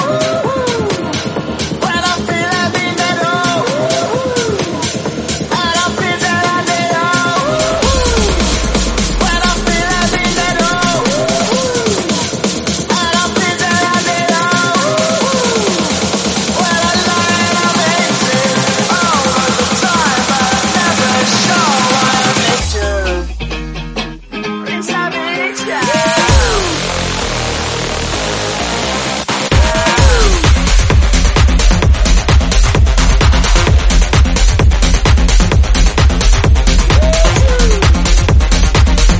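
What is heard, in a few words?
Tech House 130bpm F minor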